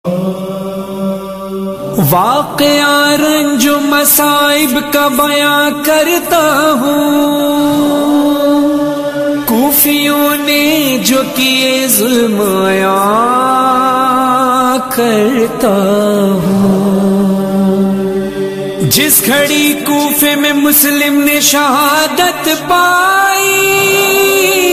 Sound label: noha tone